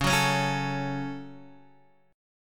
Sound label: C sharp Suspended 4th Sharp 5th C sharp down Guitar Open D